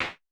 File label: CLAP, Vermona Mono15 Drums